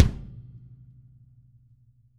kick

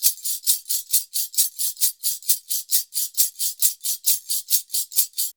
089 FREES, Modern Percussion Loops; USB Soundscan vol.56; AKAI

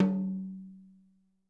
HITOM, drum

